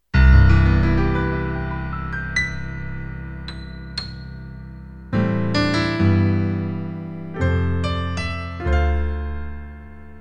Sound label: Roland F-20 Concert Grand